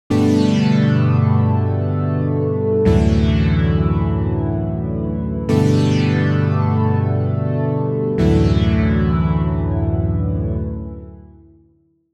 Massive Analogish

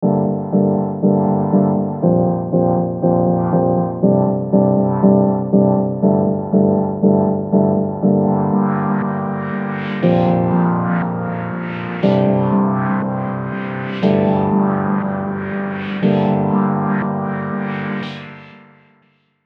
chords

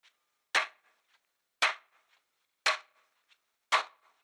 Clap Loop

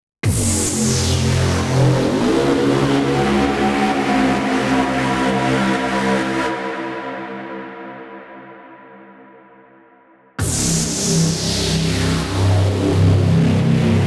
Ableton Effects

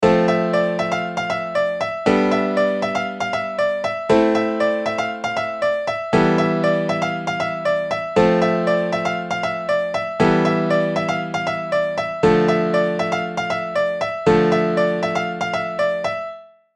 Chords

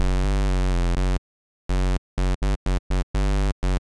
osc a

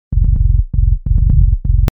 attack

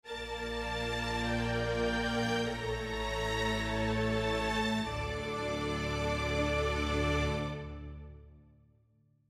Str Samples Ensemble

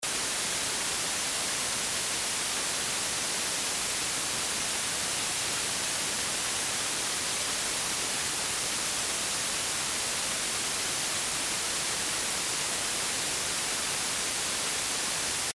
noise

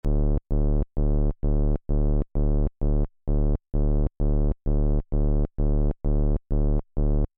minitaur techno

